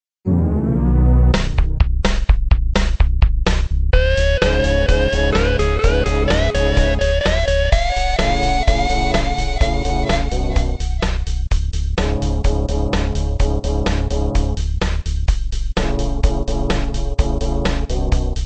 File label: stage clear dixie